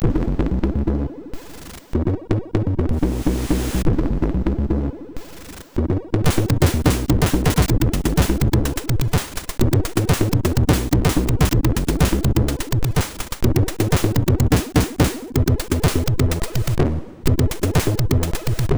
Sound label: Funky sid MOS6581; hvsc